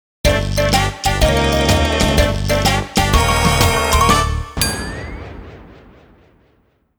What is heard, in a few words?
Scenario Failure